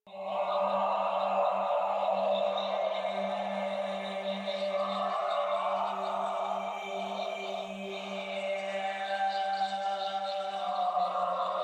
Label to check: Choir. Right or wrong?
Right